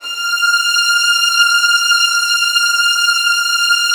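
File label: violin; Virtuoso Strings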